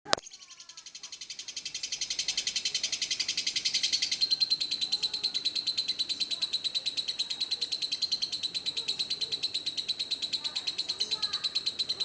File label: audio lovebird